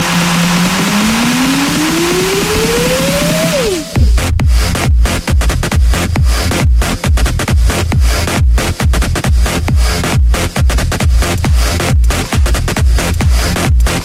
Great Bass

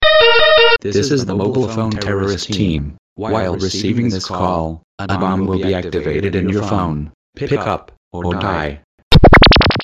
Cellphone Bombing

